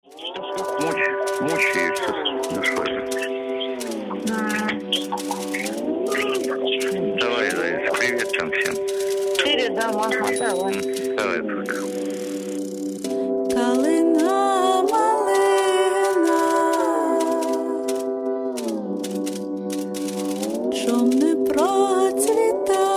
Blues

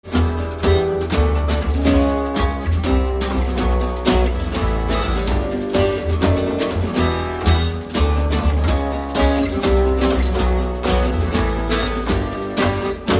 guitar live

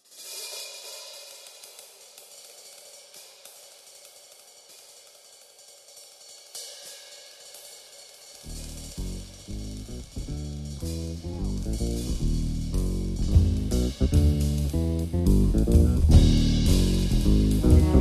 drum

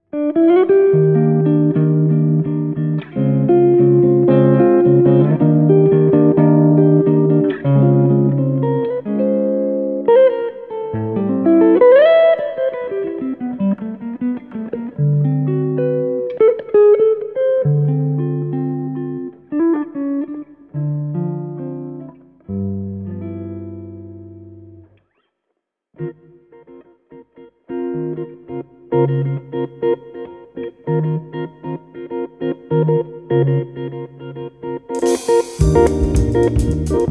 Jazz

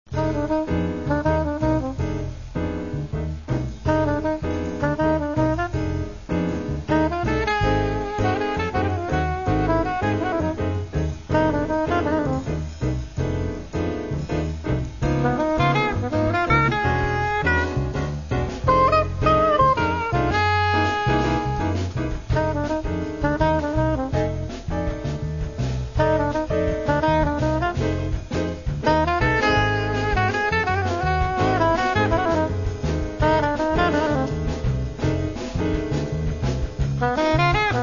jazz; ukr jazz

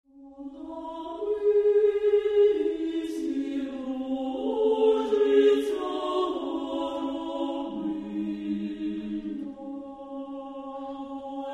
choir; NRCU

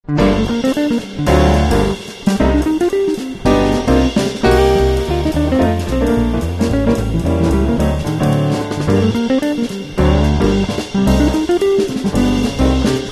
guitar studio

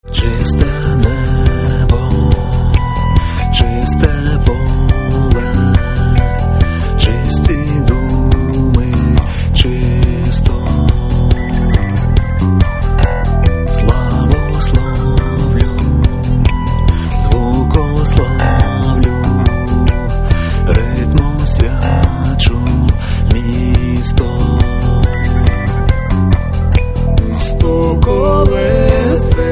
rock

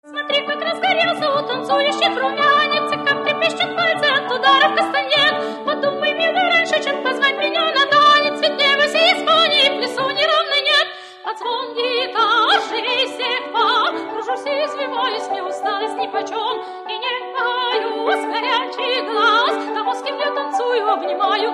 vocal miniatures

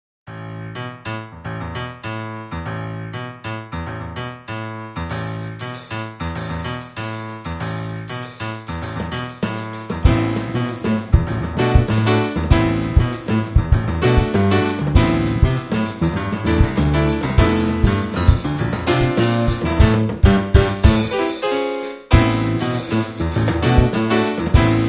welikefunkyjazz

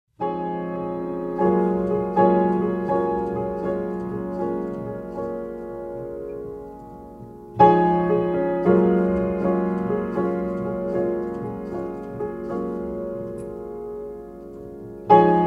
Piano

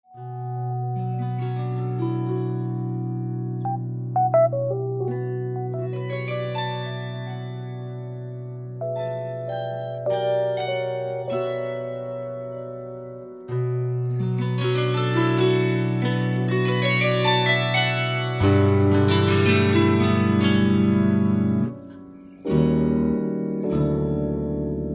piano studio

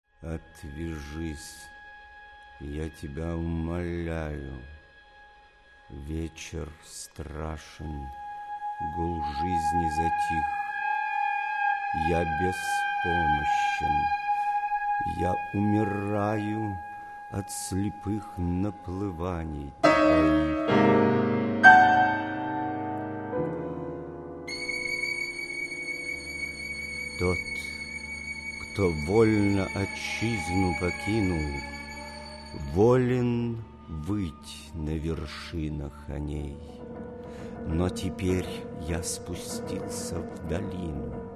chamber

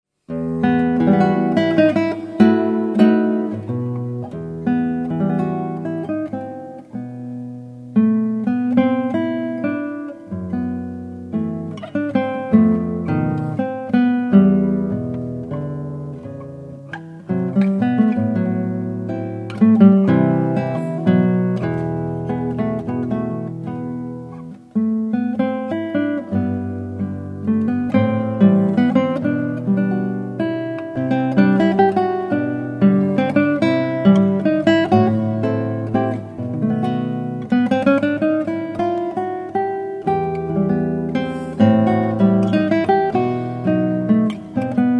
Romantic Guitar

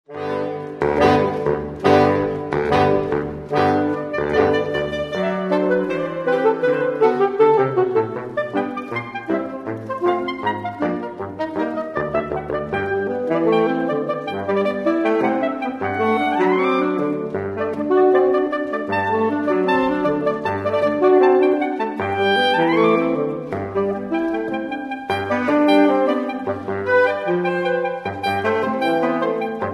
sax classics jazz

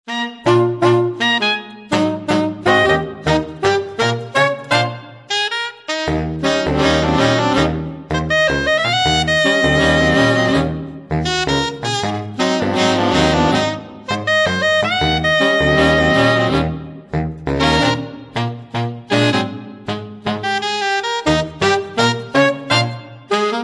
sax

